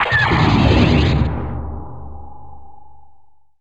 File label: D+B